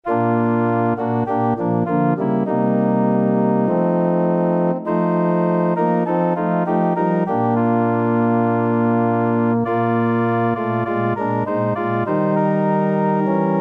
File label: organ